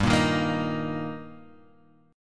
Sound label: G Minor Sharp 5th G, chord; strummed; JGuitar